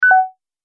Multimedia Event Notification, beep sound effect

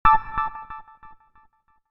Short Multimedia Alert Effect, Chime; Chime Sound Effects; Chimes (Sound Effects:Multimedia); Stuart Duffield

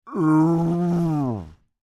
Creature Moan Exhausted, Human; Groaning; Voice; BigRoomSound

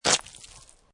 Snow And Ice Hard Snow Quick Grate With Debris; Snow Sound Effects; Snow (Sound Effects:Weather)

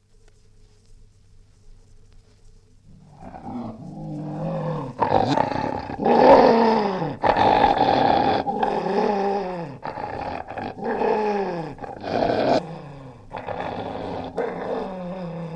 Spaniel, angry snarling; Dog; Part of Dogs (Sound Effects:Animals); BBC

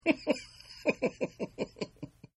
Giggle Male; Laughter; Partners In Rhyme

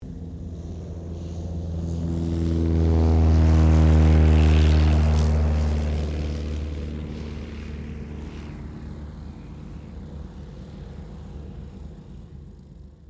AIRCRAFT PIPER PA-20 PACER TAKEOFF; 1952; Airplanes; Vehicles; Aircraft Collection; Twistedtracks

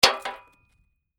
Metal Impact Metal Sign Hit By Rock; Muted, muffled metal hit sound as stone impacts with metal plate; Metal Sound Effects; Lynne Music

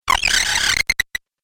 Game Glitch Effect, Video Game Sound Effects

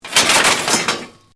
METAL CRASH; Materials

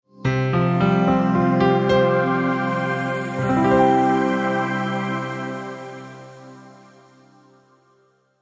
multimedia logo, Musical Identity/Signature; Short Musical Signature / Ident; Sonic Logo; Sound Design; McKinney Sound

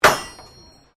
Blacksmith Hammer Strike; Colonial era objects; Hammer Sound Effects; Hammers (Sound Effects:Tools)

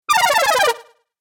Videgame Failure Fx; Video Game Sound Effects

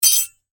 household cutlery, Cutlery Clatter; Metal Cutlery Clatter; Kitchen (Sound Effects:Household); McKinney Sound

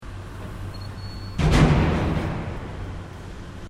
Construction Site Crash; Various construction machinery

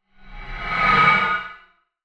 Fast Scary Metal Transition; Scary Soundscapes; Horror; Crafted Signals

